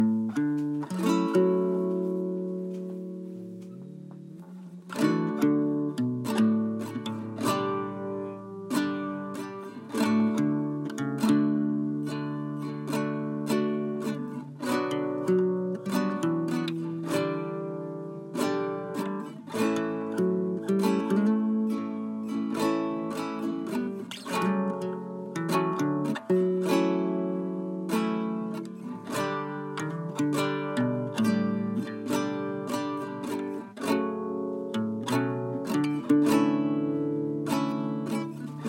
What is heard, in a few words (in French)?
Guitare; the water is wide